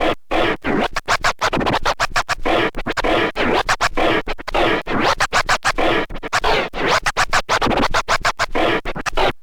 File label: scratch med, Vinyl Attack scratches mix